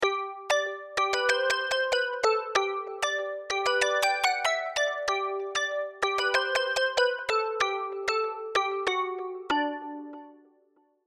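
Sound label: G 95 bpm, Latin & Reggaeton MIDI Melody; Praise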